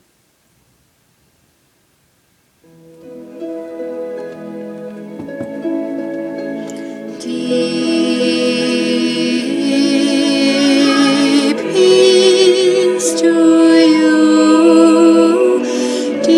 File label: Alto gaelic blessing